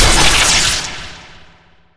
heavy sword impact; sven; gods strength

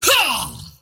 toy pug attack emote; dota; pudge

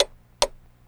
chronosphere tick; weapons; dota; faceless void

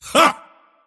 mars laugh; dota

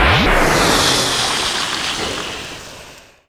poison impact; weapons; dota; dazzle

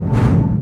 telekinesis cast; weapons; dota; rubick

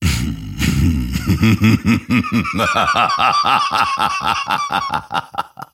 grimstroke laugh; dota

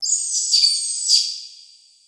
bat; dota; ambient; soundscapes; diretide

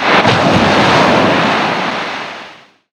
pounce cast; weapons; dota; slark

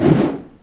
fist swing; weapons; dota; earthshaker

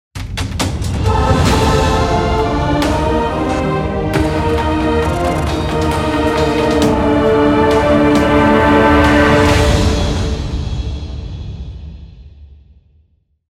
lose; radiant

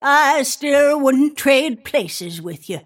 death; snapfire

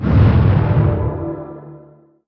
sleight of fist cast; weapons; dota; ember spirit

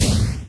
range projectile launch; weapons; dota; creep

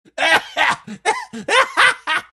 laugh; dota; gyrocopter

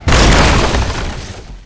engine destruction; damage; engines

